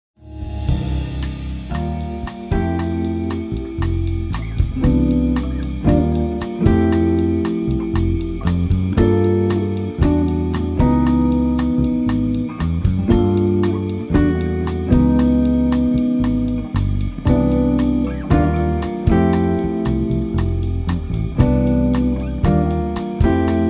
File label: Melody